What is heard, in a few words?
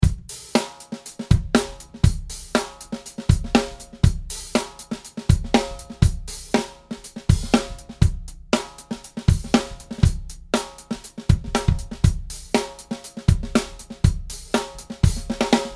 GMS PVS Snare Drum